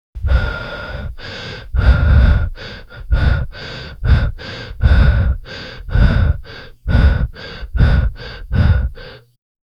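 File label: heavy realistic, strained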